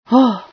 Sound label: startle, female